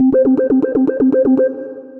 Happy Arp, Synth Rhythmic

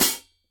HIHAT pedal; pedal 2

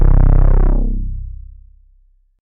Release C1, Foundation Analog Synths; Ableton Foundation FreePacks

